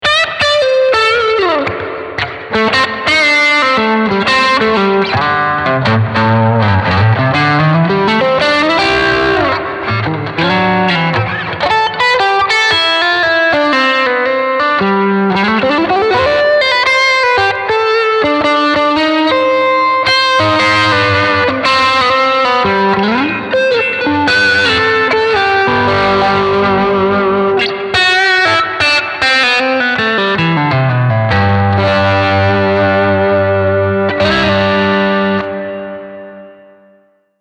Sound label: Texas Lead Reverb